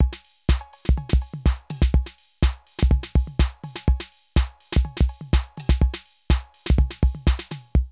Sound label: Drum loops, Euro techno